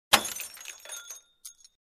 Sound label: Broken Glass